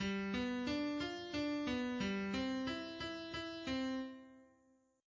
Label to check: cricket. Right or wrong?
Wrong